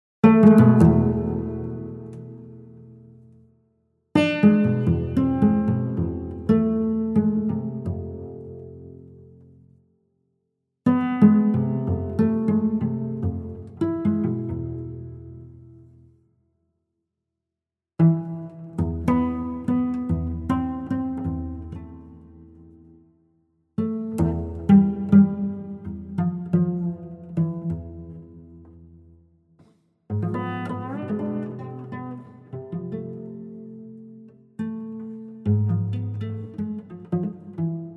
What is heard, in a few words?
cello orient